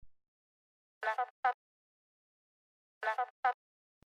Retro Tinged Garage House vocal loop

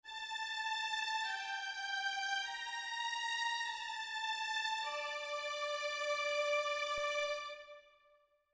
Str Samples 1st Violins

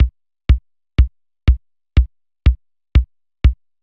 quirky cr78 drums

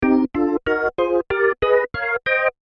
C minor scale minor triads

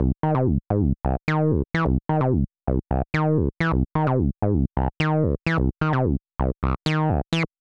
DRY WITH MOD

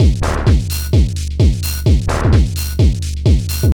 ADDED GLITCH

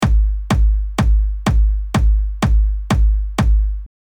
Pre Compression

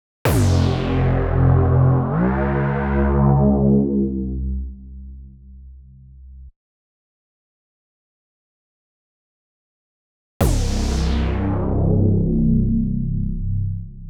LFO and Pitch Modulation

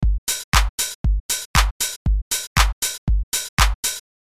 simple pattern all 8th notes

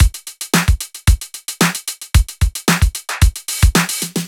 Linn Drums

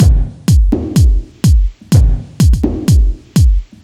perc

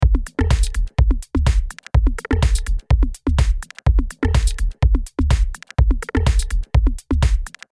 Loop 1 and 2 unprocessed mix

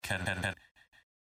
vocal dry

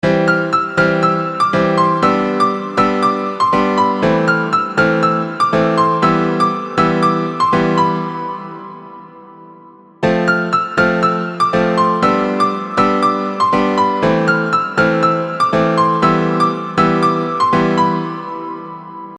Chord Progression A Minor

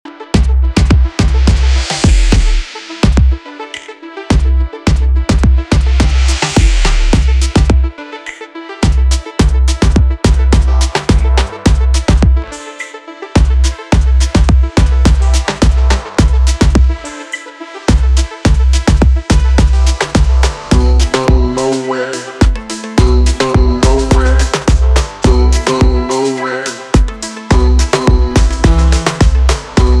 electronic and reggae

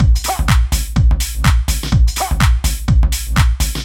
big house drums

